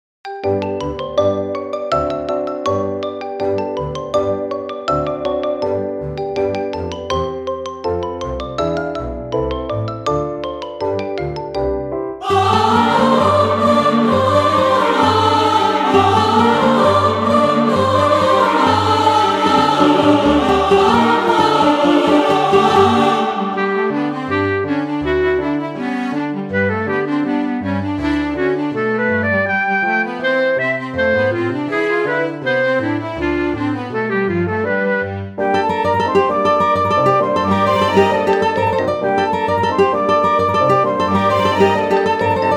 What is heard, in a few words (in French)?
Rigaudon